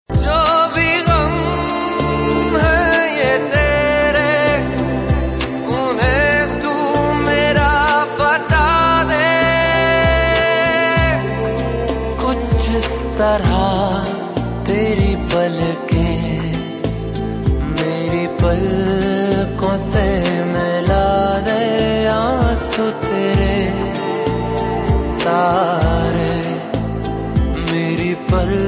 Sad Song

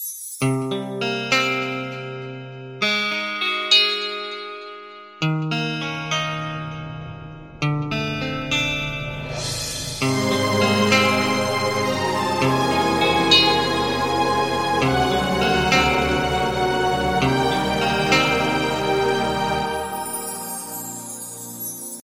Greattune

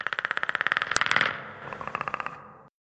predator growl

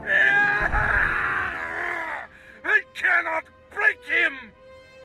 scream; Swtor; Baras

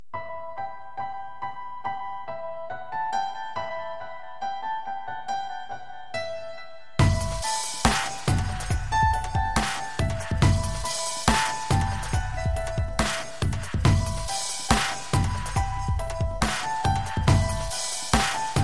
Dubstep piano

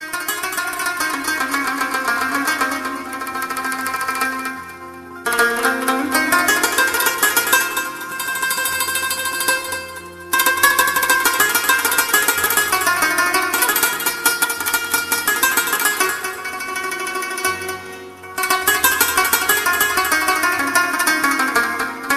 Sweet Rabab

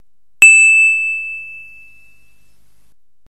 Steel SMS

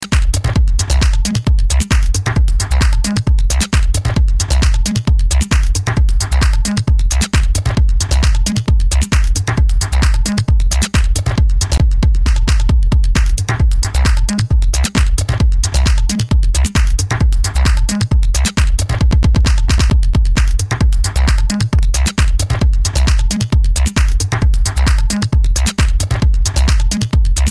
Mixed Loop

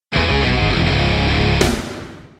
Electric Guitar

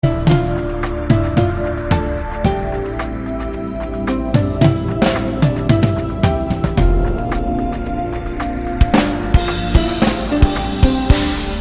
Nice And Romantic

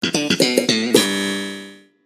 Bass; Seinfeld

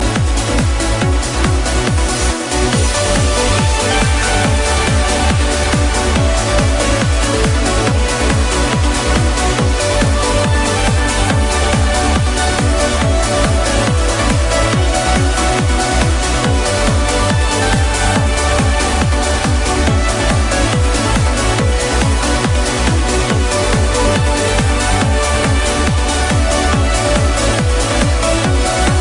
Trance

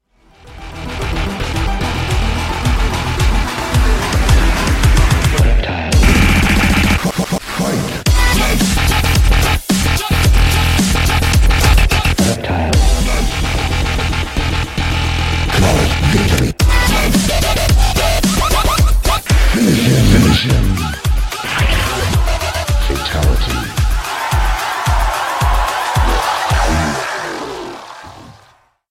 Beat Rmx

